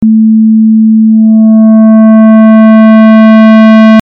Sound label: simple distorted A